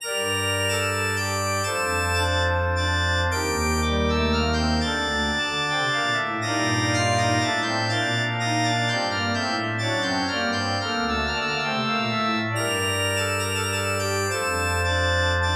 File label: Church Organ E, Minuetto in g minor, reverberated; Andreas Instrument Sounds AB